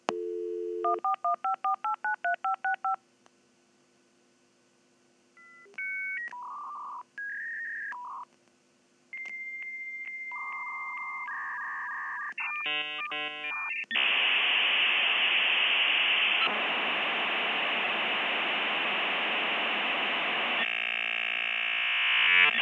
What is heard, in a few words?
Dial-up modem